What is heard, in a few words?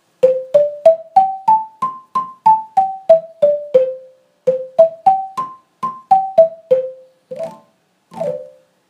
Hardwood